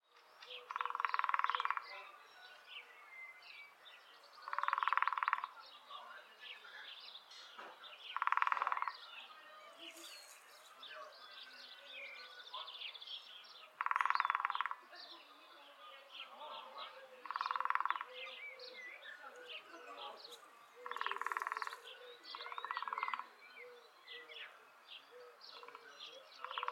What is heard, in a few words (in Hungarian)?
kis fakopacs hangja